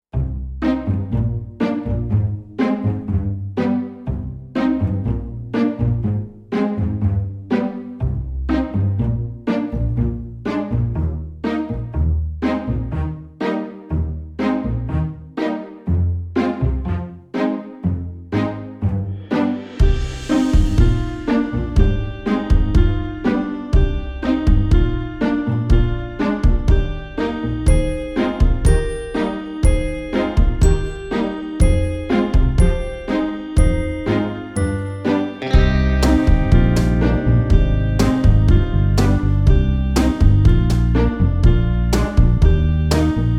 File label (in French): Version instrumentale Sans choeurs